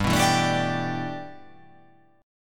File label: G 6th Add 9th G, chord